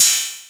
Ride, Cymbals; Vengeance Samples

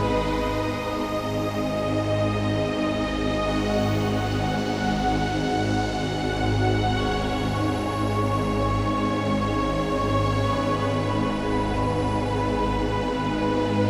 Deep Pad 138BPM, G# minor; Bassline & Melody; Vengeance Samples; VTS1 Zodiac Kit

